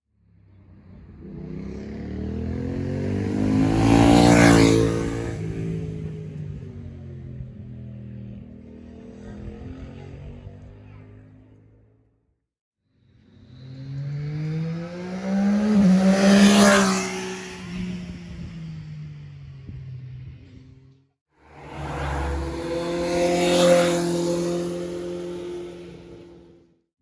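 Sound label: passing motorcycle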